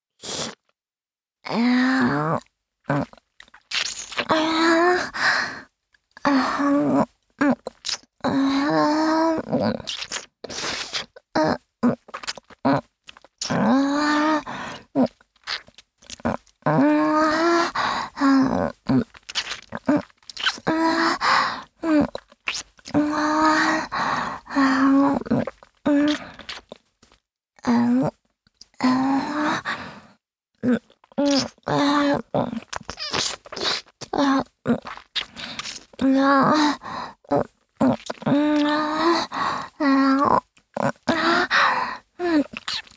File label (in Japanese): フェラチオ(中):包皮と亀頭の隙間に舌をこじ入れ舐める; 実用ボイス抜粋集